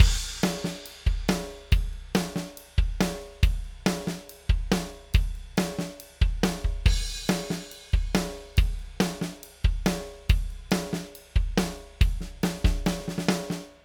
presonus ctc-1 pro console shaper drums wet classic